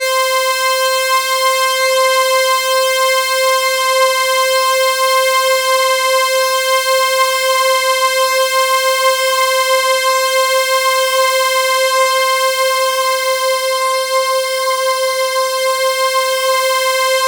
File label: C5, Synth Line; 90 s Sample CDs; NiceBeats; SynthLine VOL-1